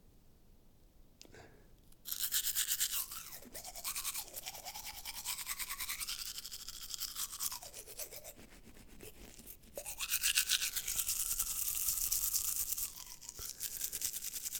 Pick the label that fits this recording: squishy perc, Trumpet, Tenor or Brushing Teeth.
Brushing Teeth